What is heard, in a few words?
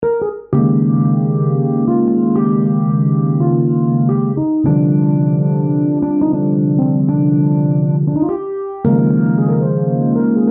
pianet Orgue